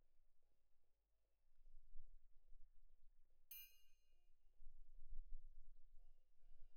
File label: Perc Main